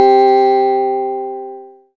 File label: SYN BROKEN, SYN MicroWave; Sound & Vision - Gigapack I CD 2